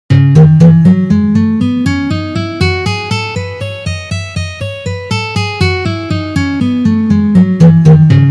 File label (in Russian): Gamma Mi mazhor 1 pozitsiya